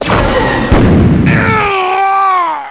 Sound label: boom; guns; JKMAG - The Jedi Knight Multiplayer Add-on Group; JKDF2